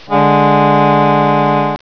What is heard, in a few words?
chord